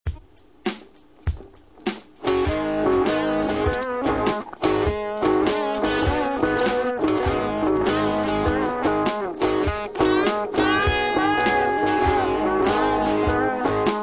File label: v-delay